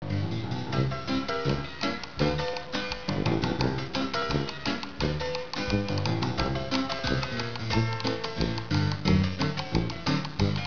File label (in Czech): klasik